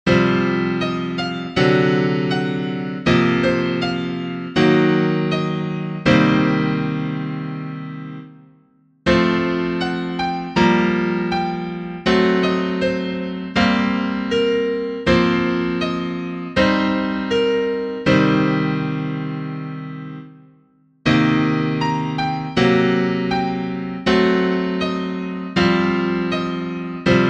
minor blues; abyss

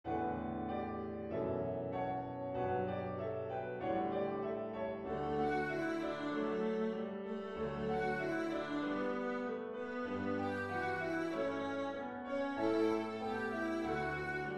jingle bells

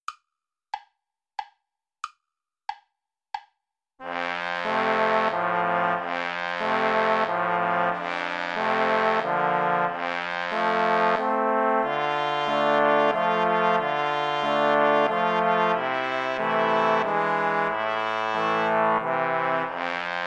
waltz play along without trumpet

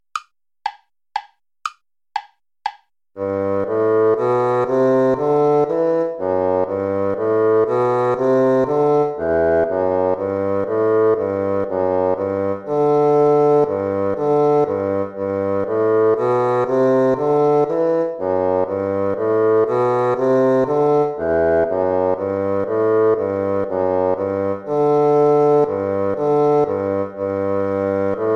baroque minuet major play along

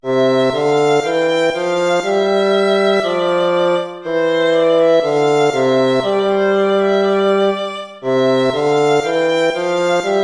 gavotta; hook james

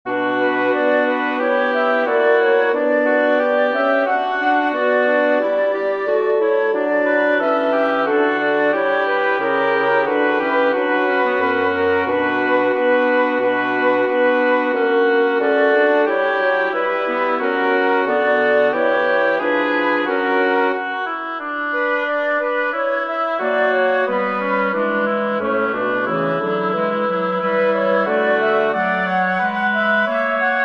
chorale for woodwind quartet; bach johann sebastian; ich hab mein sach gott heimgestellt

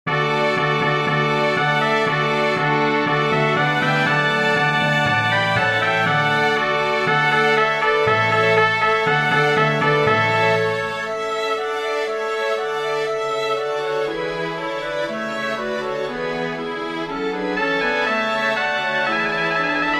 for small orchestra; bach johann sebastian; march vereinigte zwietracht der wechselnden saiten

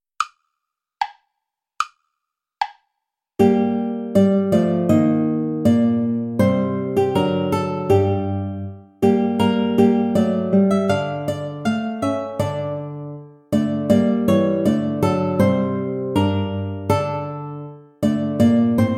play along without guitar; vulpius melchior; die beste zeit jahr ist mein

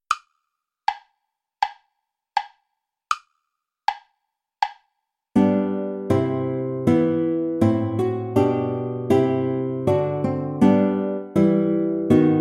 play along without oboe; reger max; der mond ist aufgegangen

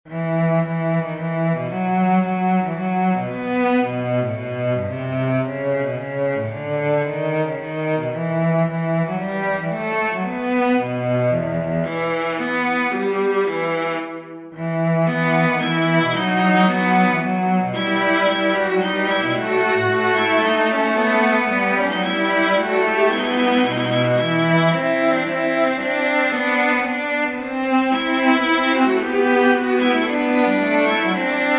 viola cello; Vanum est vobis; Nisi Dominus

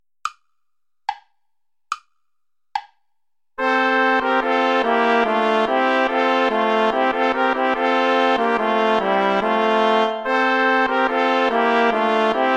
play along without trombone; traditional

